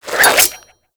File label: Grapple Windup